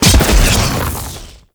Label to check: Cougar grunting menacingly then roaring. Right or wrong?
Wrong